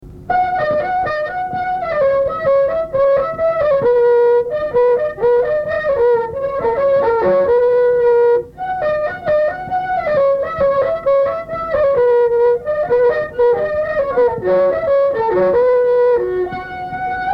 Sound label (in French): marche